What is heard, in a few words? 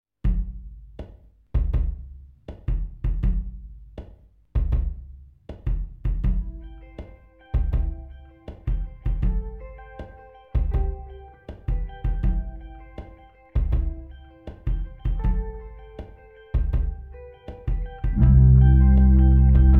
song bass